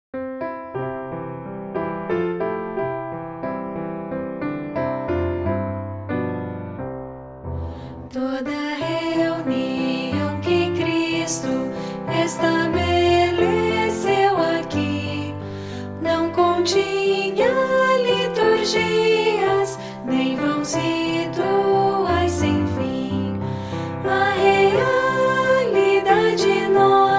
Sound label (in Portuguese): voz piano